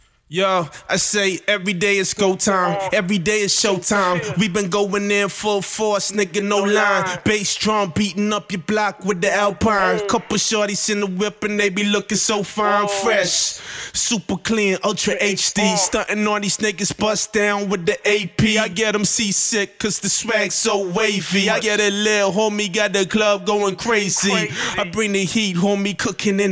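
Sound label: hip hop 166 A flat minor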